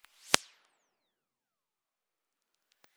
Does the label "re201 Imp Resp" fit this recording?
Yes